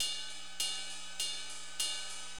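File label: ride loop; Drums; LiveAct Pool 1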